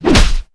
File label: swing hit